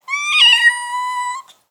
Kitten Meow; افکت صدا حیوانات; Animals

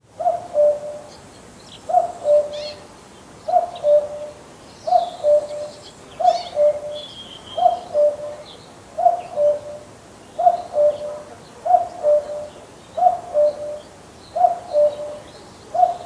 Cuckoo; افکت صدا حیوانات; Animals

